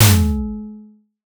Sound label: impact logo sting